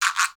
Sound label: AFRO GUIRO; Spectrasonics; Heart of Africa